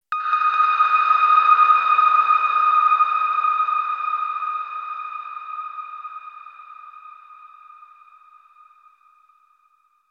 Stinger Exploration Tone

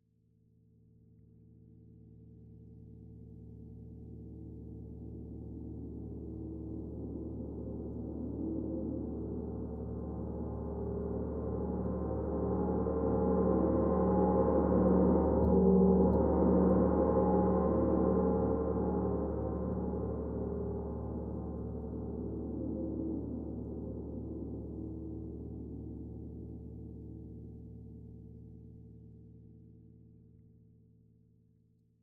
Drum and Harp Mood; the last of us

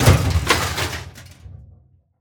metal break; teardown; ps5 windows xbox series xs

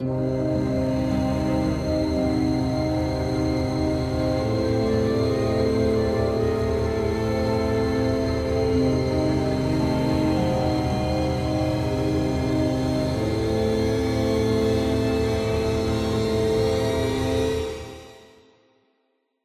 Stealth Layer Suspicious; Sarco; tintin reporter cigars of the pharaoh

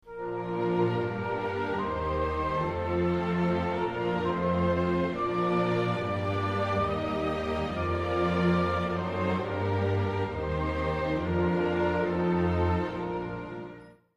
lose jingle; Britain

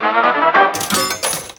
SFX Training Luck; Fallout Shelter

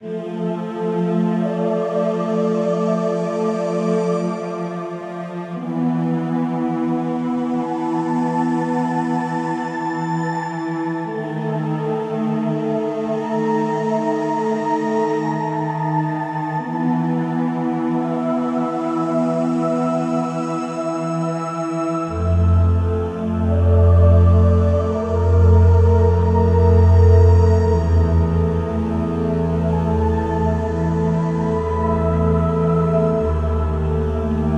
bgm game no piano

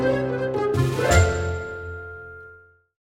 success jingle; macguffins curse

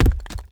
masonry hit

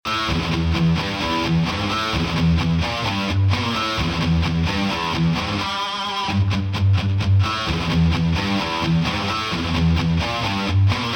Heavy Riffs; Astro Flame: Starfighter; PS4, PS5, Switch, Xbox One, Xbox Series X|S

